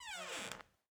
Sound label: door double wood close start; Rust